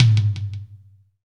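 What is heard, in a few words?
PER PROCU, Procussion, percussion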